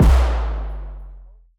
drummer gun 808 sub gunner kic

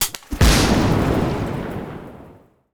explosive chemical crossbow

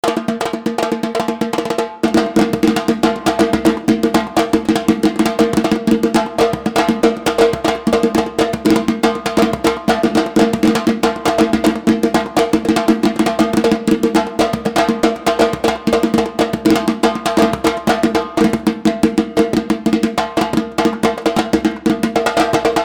samba duro solo

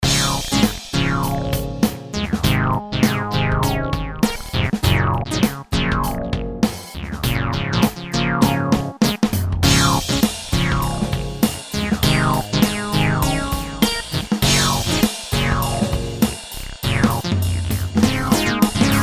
clavia nord lead; bassesland

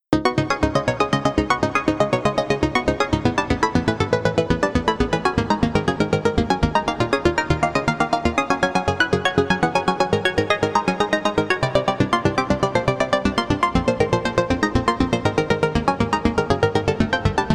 funky rhythm

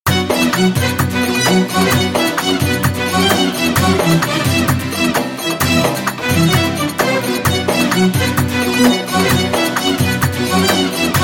spiccato and pizzicato

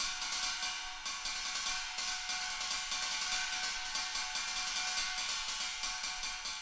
145 drum loop

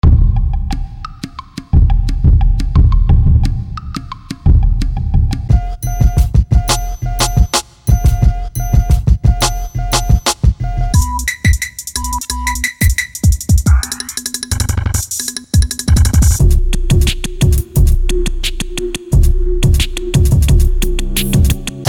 retro beat machine

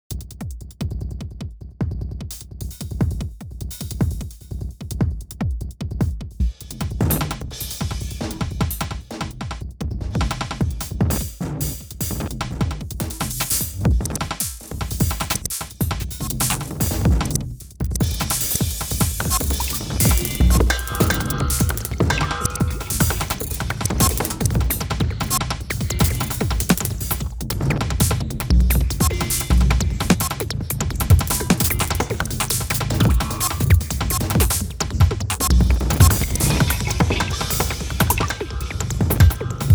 sequences drum; neuralscore

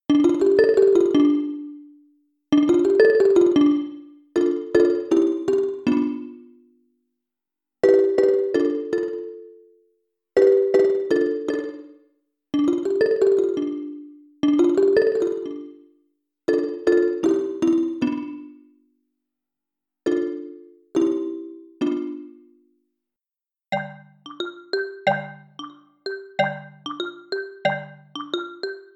modernist xylophone

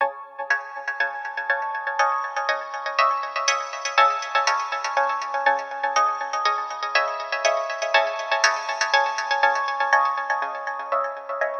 trap intro

